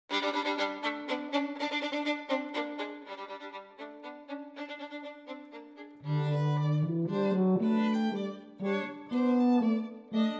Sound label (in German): Unterkagner Ländler für Violine und Tuba, Moderato